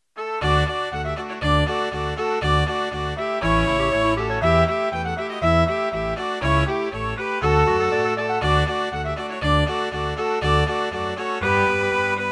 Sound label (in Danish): skorstensfejerenpolka MIDI